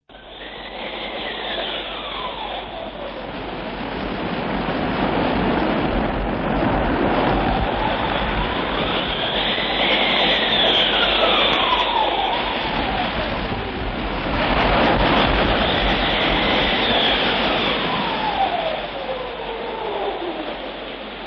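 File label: storm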